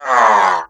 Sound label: pain, male; midnight guns; bootsector male 00